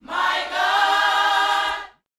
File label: YOUTH CH PHRASE, Vocal Planet Gospel